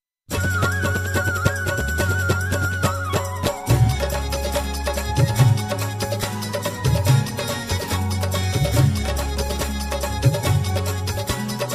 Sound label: Swaminarayan Narayan Dhun